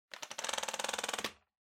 Vacuum Cleaner Pipe Extend; 掃除機03-36(パイプ 伸ばす)

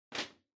Power Strip Far Noise Cable

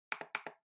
Trackpad Click Far L Double

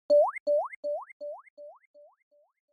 Anime Motion Mid Delay

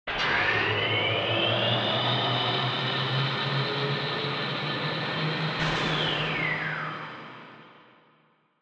Retro Anime Mech Equip

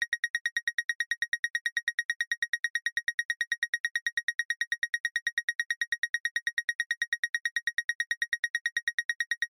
Motion Tremble Slow Loop

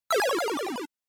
Action Miss

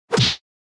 Hit Punch Dry

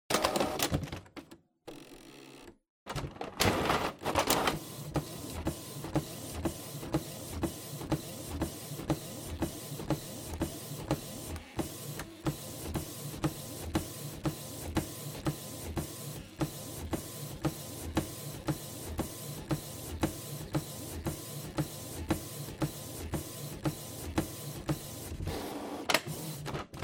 Printer Print Color

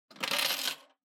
Public Phone Coin Return 10 Yen

